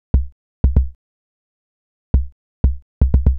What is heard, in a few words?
77 kick; hydrogen